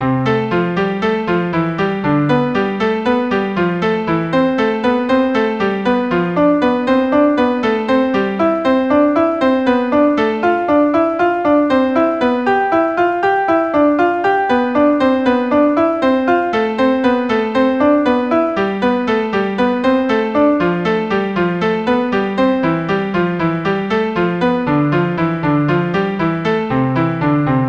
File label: Hanon 19 complete